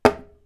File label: wood blow